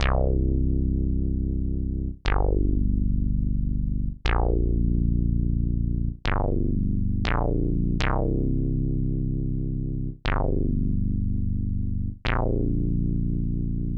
JX 4 bass without

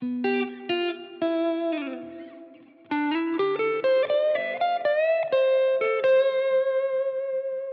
Tele Country Lick key C Maj 124bpm

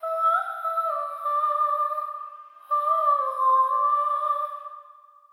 Voice key A min 90bpm

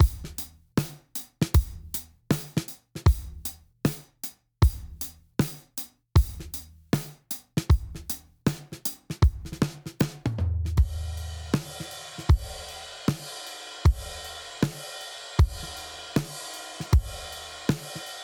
Drums PreEQ

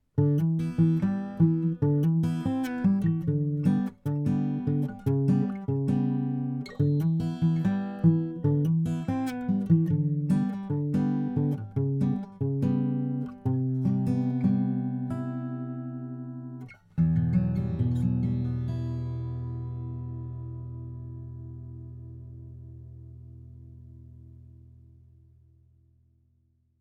acoustic guitar orig

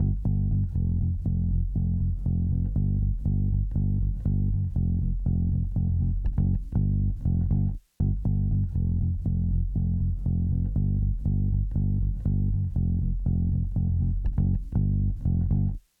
Bass FX

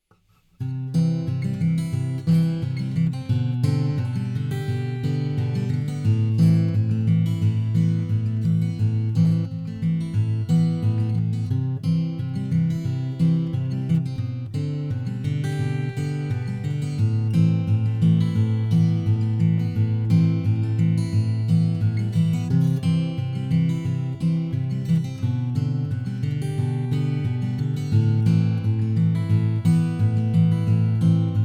Guitar picking PreDriftEQ

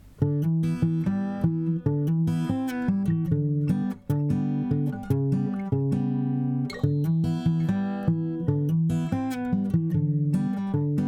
acoustic guitar FX

